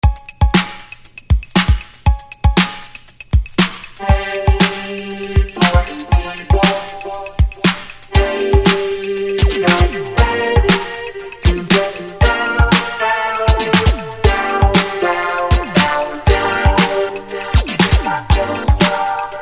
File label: DISCO Funky Soul